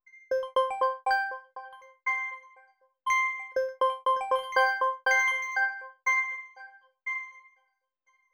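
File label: granular bell accent C major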